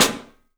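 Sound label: PICOLO SNRS, snare, drums; 3D Sound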